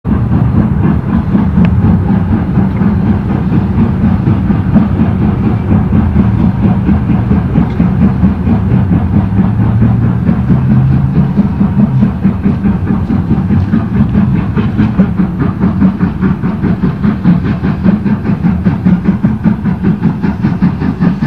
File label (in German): Vorbeifahrt; alsdorf